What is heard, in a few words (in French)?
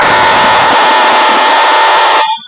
chargeur